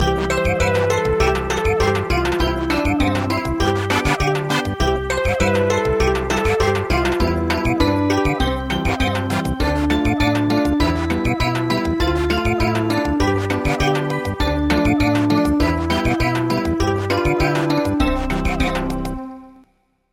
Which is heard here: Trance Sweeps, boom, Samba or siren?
Samba